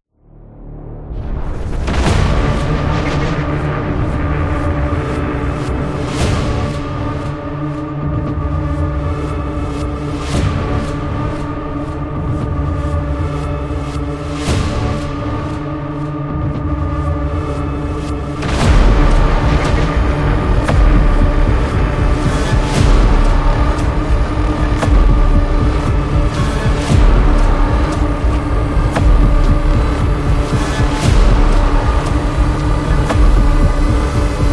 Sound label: Ominous